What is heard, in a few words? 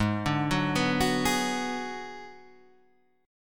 Ab Minor 7th Flat 5th, Guitar, Half Step Down